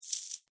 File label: Insect Hiss Angry, Insect, Hiss, Angry, Bug; Insect Hissing; Blastwave; Animals:Insects